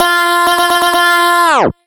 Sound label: filler tool 128 C; Electro; filler tools; Anthemic